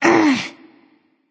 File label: clear throat; smite; artemis